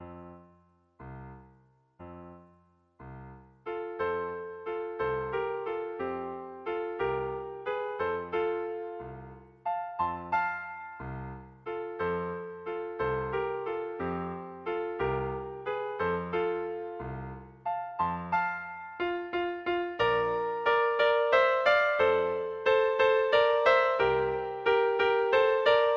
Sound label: capriccio, clarinet duet